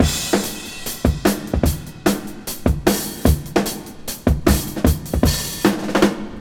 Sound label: 75 bpm breakbeat sample e key, Free breakbeat sample - kick tuned to the E note.